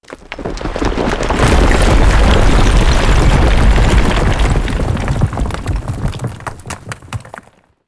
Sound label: collapsing ruins; ancient relics